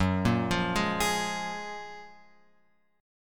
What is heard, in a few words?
F sharp Major 9th; F#M9 Chord {2 1 3 1 X 4} arpeggiated